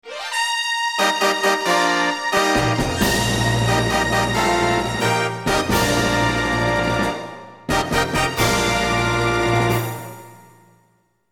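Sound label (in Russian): kogda vyhodish na scenu vkluchaut fanfary, fanfare when you go on stage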